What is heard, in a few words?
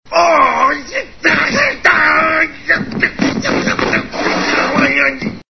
argh